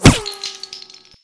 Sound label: plaster bullet impact; Rust